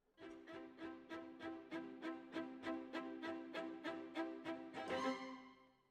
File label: Violas, jazz for piano